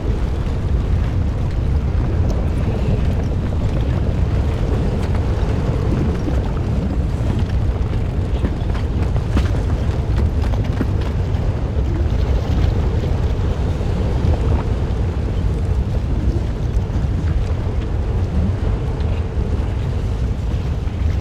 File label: Volcano (ambience); Fate 4 The Cursed King